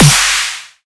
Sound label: Snare